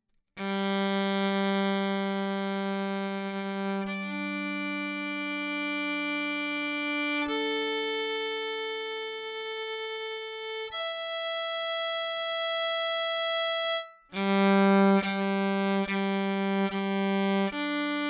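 Vln pickup w Redeye single treble full off, violin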